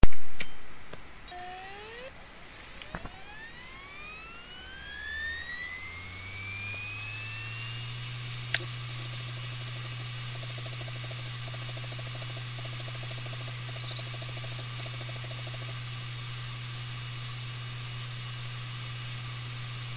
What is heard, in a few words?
Healthy Seagate Hard Drive; What are the Quietest hard drives (HDD)